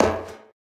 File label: pipe step; Golden Crayon Sounds